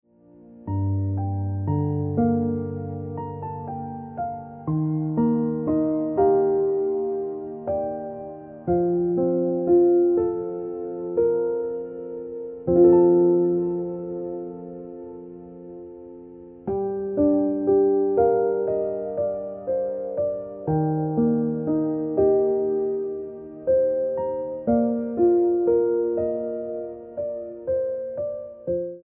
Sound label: Piano Music